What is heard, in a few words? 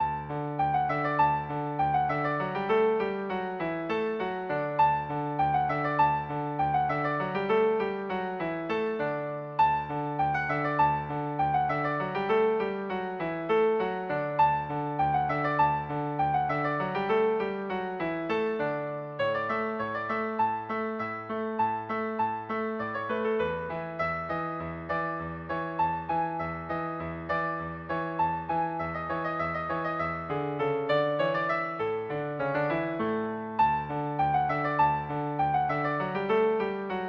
bach musette, violin-cello duet